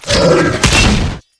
attack

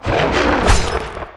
Attack

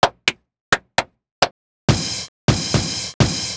handclap

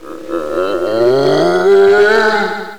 Magic Skull Die

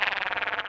Scorpion

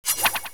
Assassin Attack

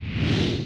Telekinesis

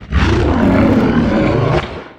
Death

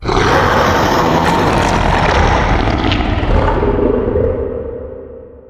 Death

